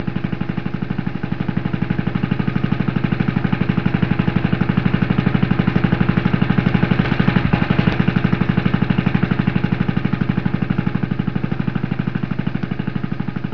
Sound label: nautical